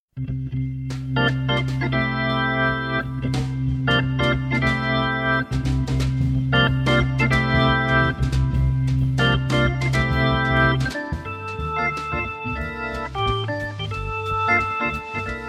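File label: orgues Hammond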